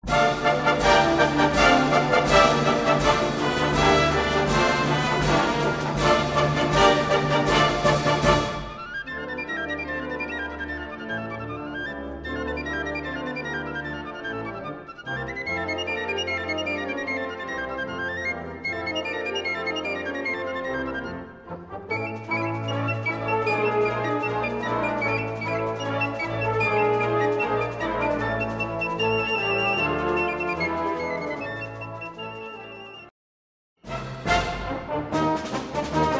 Piccolo

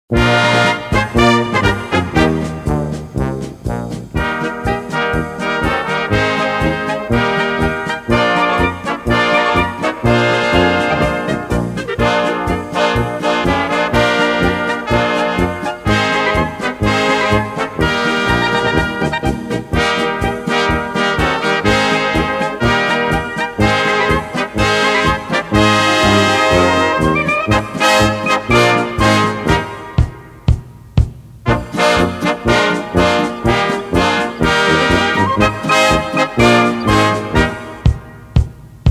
Posaunenpolka

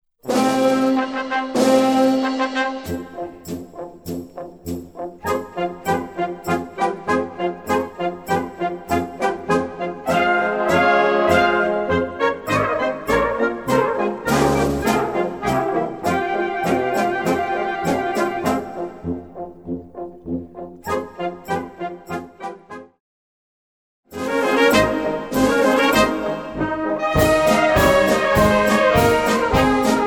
Persischer Marsch

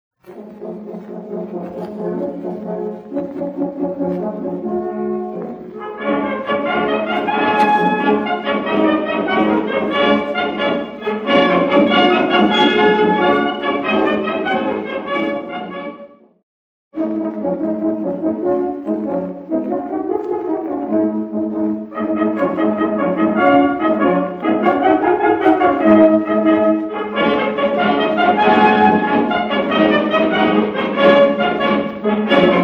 JAGDMARSCH

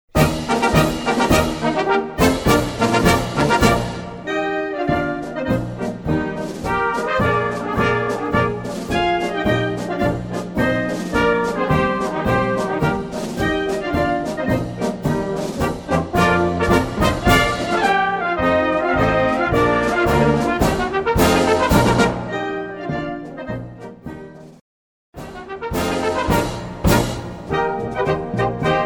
Marsch

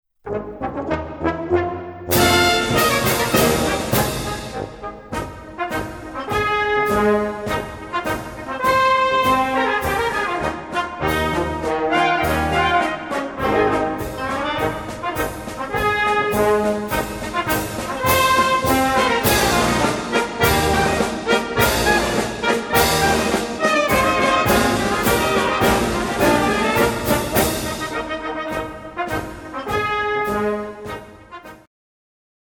Marsch

